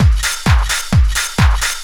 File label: drum 130 tribal, drumloops